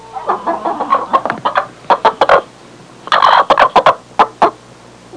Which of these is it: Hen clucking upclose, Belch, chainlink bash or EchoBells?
Hen clucking upclose